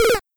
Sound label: die; smb1